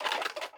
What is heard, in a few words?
Creak Metal Sign Squeak; SFX Kit